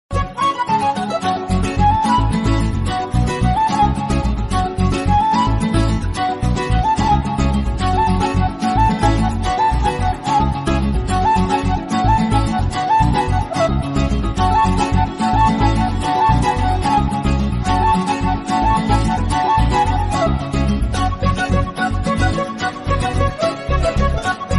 tamil love bgm ringtone love ringtone

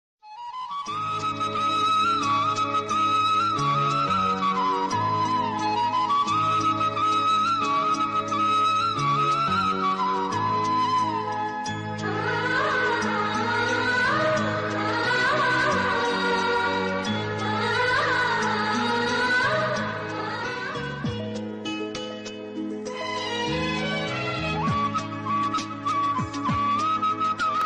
background music ringtone; ninne premistha

